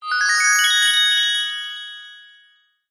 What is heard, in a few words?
fantasy magic glissando rise bell tree style fairy small cute; zapsplat